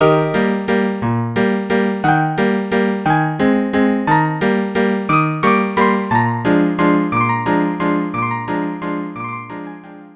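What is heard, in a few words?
violin viola cello; La Traviata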